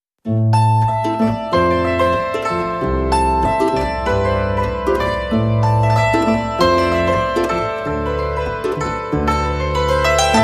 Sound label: No Percussion